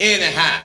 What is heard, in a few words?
Vocal Phrases; Vengeance Samples; Vocals